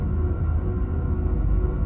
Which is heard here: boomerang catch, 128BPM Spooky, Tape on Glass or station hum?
station hum